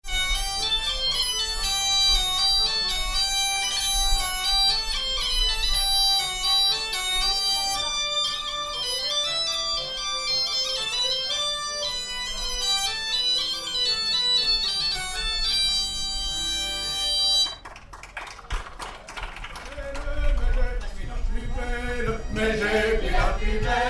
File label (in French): defile carole chantous bombardes; charivari